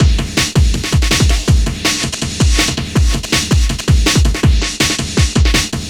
Drum Bass, Electronica; Sonic Foundry; Electronic Point Blank; Drums & Percussion

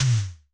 tom, Roland MC-909

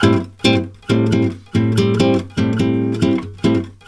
A MIN, Brazil Bossa; USB Soundscan; AKAI

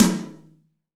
ROOM TOM; EdgeSounds; Drum Mashines; R-8 DRUMS

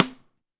DRY RIM, STUDIO DRY SNARE

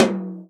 TOM, Real Drum Kit; Best Service ProSamples vol.43; JUNGLE KIT

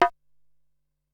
Bongos, Ensoniq SQ-R Plus

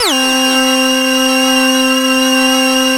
JV-1080

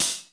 REAL CH; Crypton - DrumBase5000; R70 KIT #2

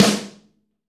SN, LA SNARE, Snare

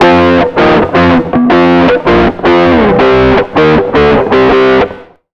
160 H ROCK, Electric & Acoustic Guitar Loops; USB Soundscan vol.04; AKAI

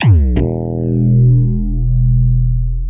FUTURIST DRUM N BASS; Sonic Foundry (Sony Creative Software); Not Just Another Pretty Bass

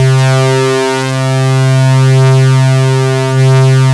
C3, MOOG2 LEADS

